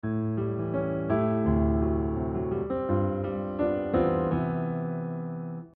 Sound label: cadence